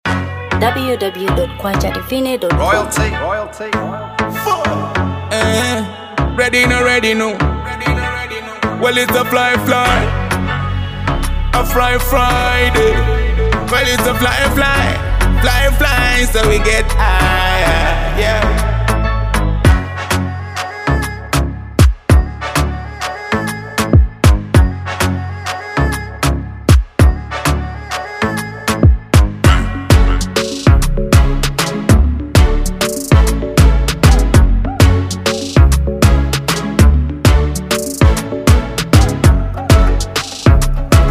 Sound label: Friday Challenge Beat